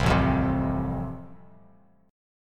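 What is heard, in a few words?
A Minor Sharp 5th, Bass Standard, chord strum; Am#5 strummed; 8,0,3,5; JGuitar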